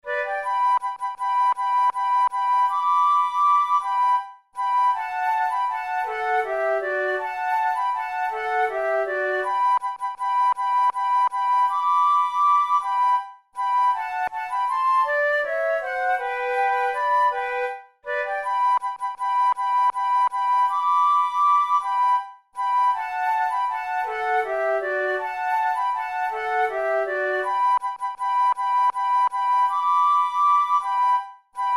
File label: waltz duet; hummels